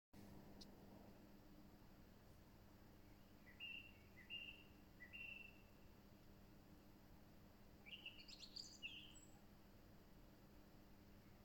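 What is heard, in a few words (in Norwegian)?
måltrost kanskje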